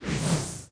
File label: ui quickbar item